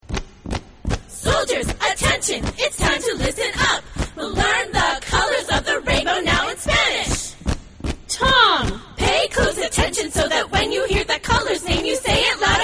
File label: tom